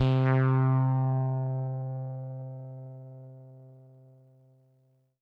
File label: Synth Prophet One Shots, Keys C; Electronisounds